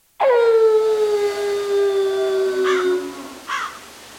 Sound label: night howl; zelda